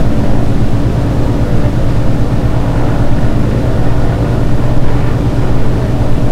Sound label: c46 cockpit, engines; War Thunder